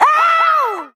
ow, Voice ooh ahh ow ouch

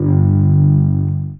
flippant, Bass

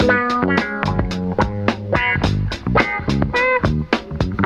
full, Loops, Phonky

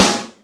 cowboy, snares real

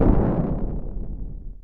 bark, Ticks; Misc Percussion

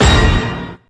bruise, Stabs

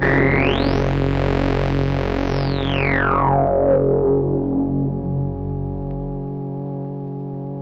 car, Electronic Loops

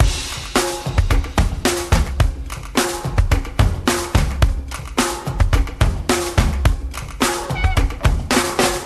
clap along to this, funky drum loops

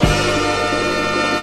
whirr

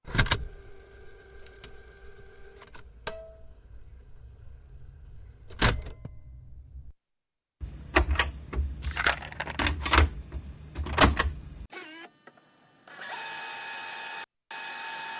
cassette

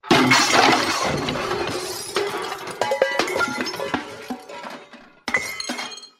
crash; Crash Bang Boom

